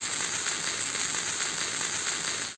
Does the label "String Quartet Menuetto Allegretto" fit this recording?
No